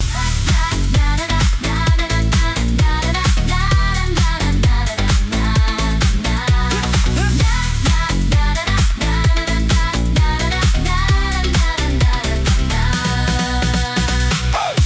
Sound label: loop; opening